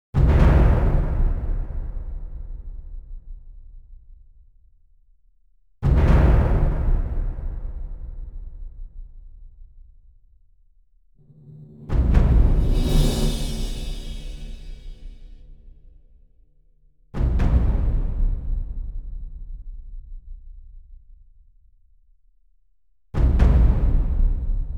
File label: percussion only